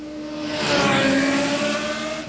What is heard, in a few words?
Race Car; Working Model Program